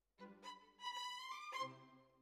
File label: Violins, jazz for piano